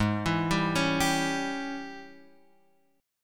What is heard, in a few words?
Ab Major 7th Suspended 4th Sharp 5th Ab Guitar Open F; arpeggiated; x,3,4,2,4,2; AbM7sus4#5; JGuitar